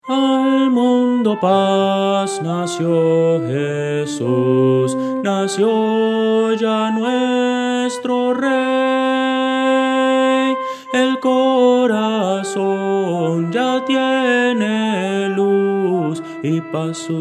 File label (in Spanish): soprano